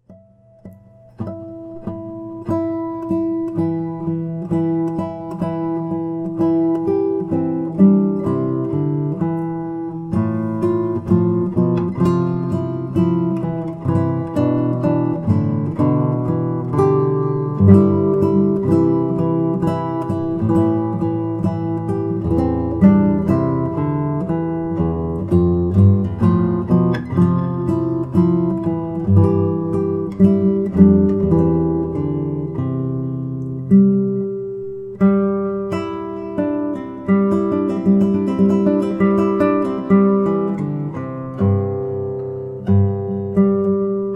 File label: variations jingle bells; pierpont james